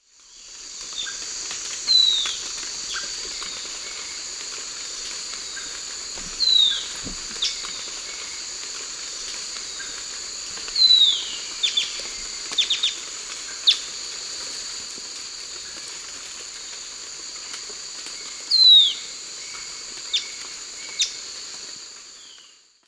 Pithys albifrons, song; S. America; Eastern Lowlands

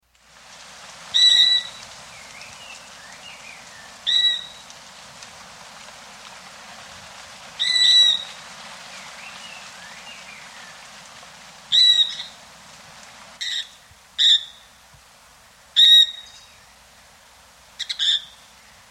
Red-Winged Parrotlet, S. America

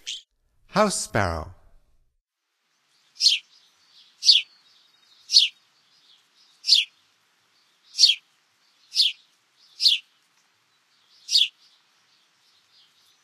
House Sparrow; North America; Stokes

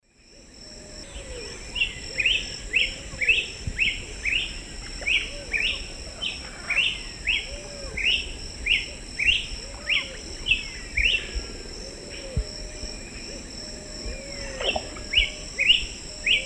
Paroaria gularis, song; S. America; Eastern Lowlands

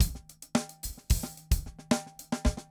funk 110; odd loops

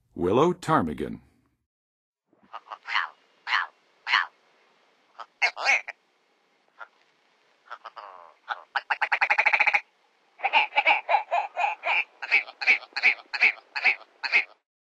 Willow Ptarmigan, Western USA Songbirds; North America

